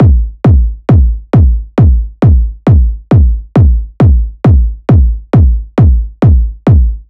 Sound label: Kick Loop 135, Techno Drums